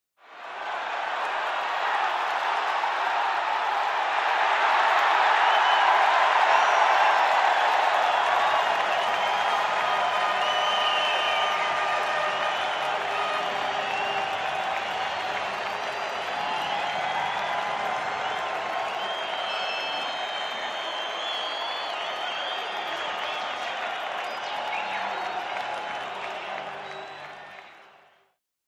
Crowd cheering with horns

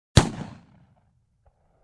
Single gun shot

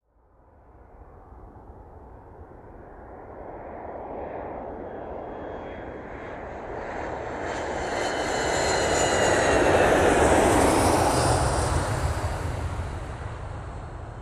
Aircraft Lifting Off Passed By

